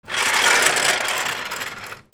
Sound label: TOYS